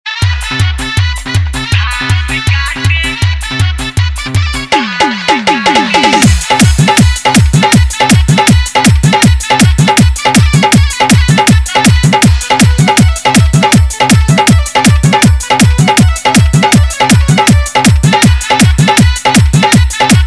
Bhojpuri Hit Old is Gold Best Dhollki Bass Jhankar Remix